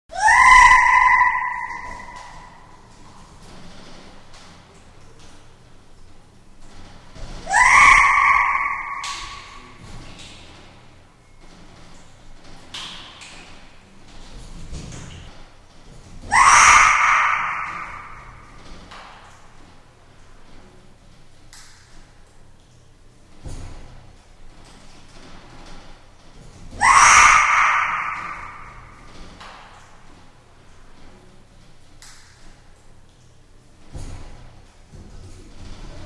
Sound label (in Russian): shimpanze s eho